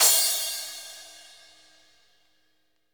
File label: CRASH; Sonic Reality